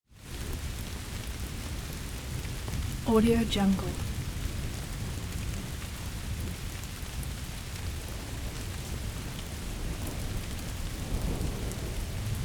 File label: mass thunderstorm rumble crack; prosoundfx